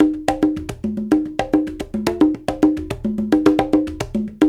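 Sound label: 108 bpm, Congo; Spectrasonics; Supreme Beats; World Dance; Isle Congo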